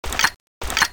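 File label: quad barrel clip in, weapons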